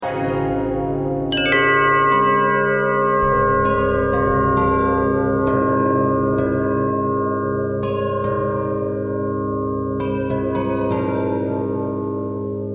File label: bell harmonic twist